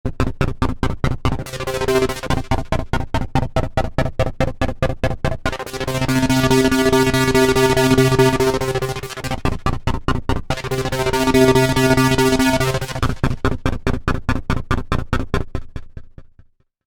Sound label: modal electronics cobalt5s